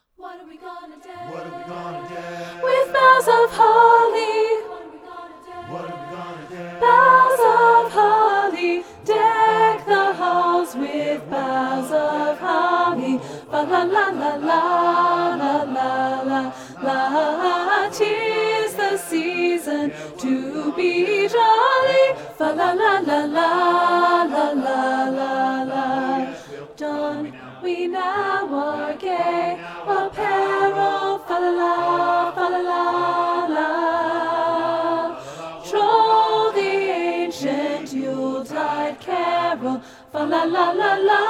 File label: DECK THE HALLS ALTO, learning tracks; PAP DECK THE HALLS